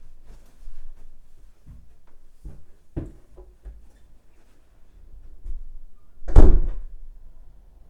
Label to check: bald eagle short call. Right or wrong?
Wrong